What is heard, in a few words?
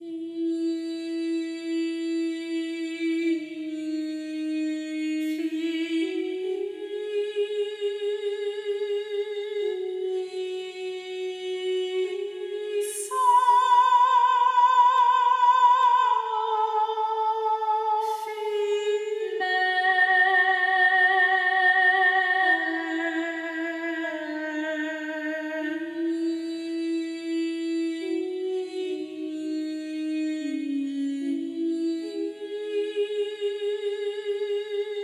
SONG VOCAL AUTOMATION OFF; KONTAKT THIRD PARTY DEMO